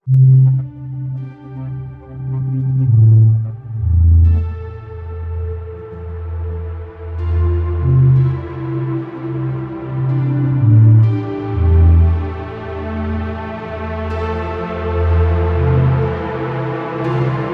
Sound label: Ambient